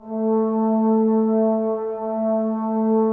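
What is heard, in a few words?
brass trombones A, Woodwind Brass Ensemble; 90 s Sample CDs; Miroslav Vitous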